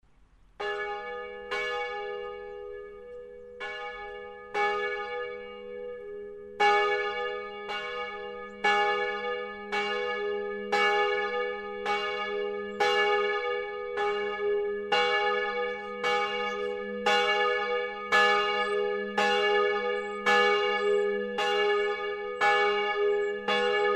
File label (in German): Glocke GIS; St. Nikolaus